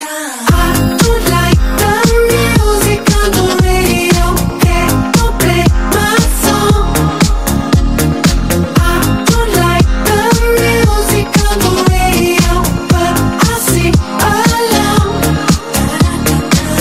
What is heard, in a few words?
music on the radio